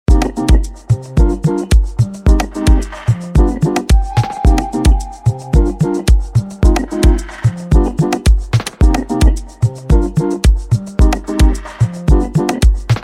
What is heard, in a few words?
BEAT, Afrobeat Instrumentals